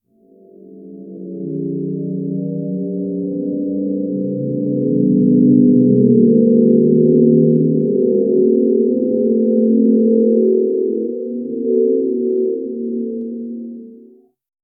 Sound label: low old film grain sound